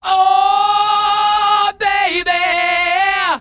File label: ooh baby, SUNG; ooh ahh